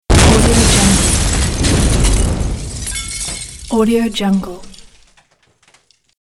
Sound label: big explosion